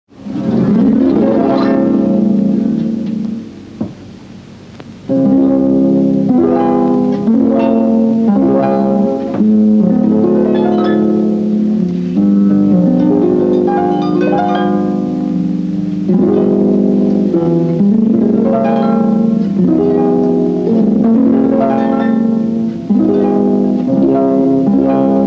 bed strings

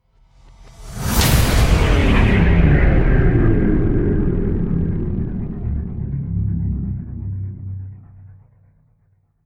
power downs drift drop with slam

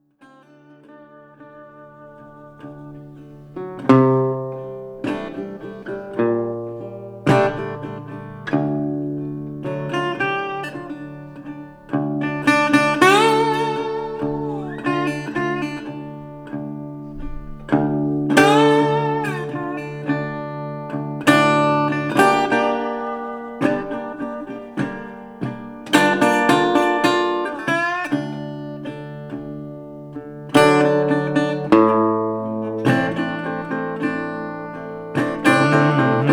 instrumental slight humming

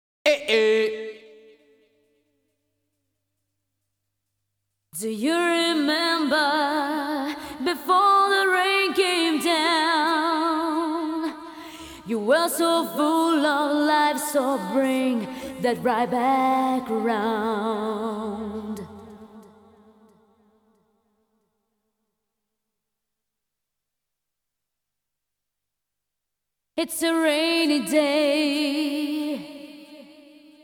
acappella fast

